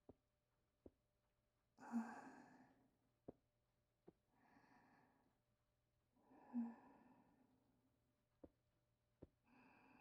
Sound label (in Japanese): 一番 小さい, 音量 キャリブレーション 用